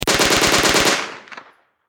Submachine Gun Mp40 German 9Mm Long Burst Distant Perspective; Warfare